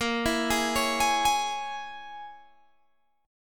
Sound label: A sharp Minor 7th Flat 5th, down, Guitar, Open G